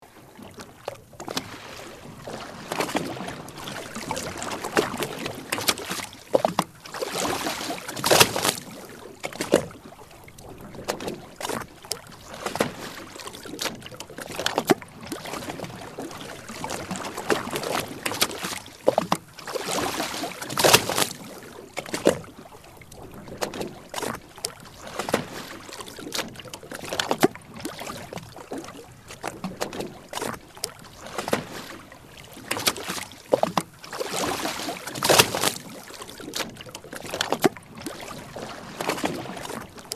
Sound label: Boat ambience waves lapping in close perspective